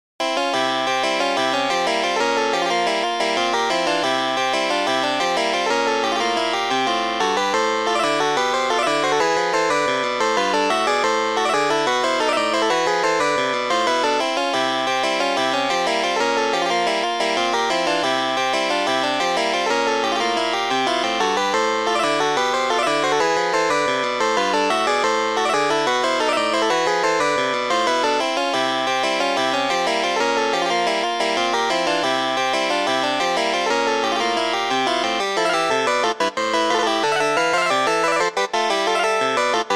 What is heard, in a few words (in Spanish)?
danza oriental, midi